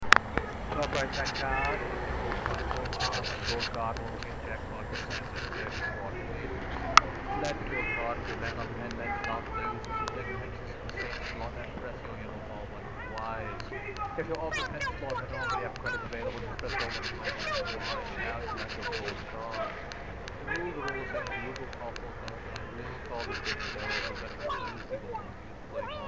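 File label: real life acapella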